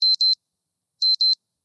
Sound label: Beep, ringtone; xiaomi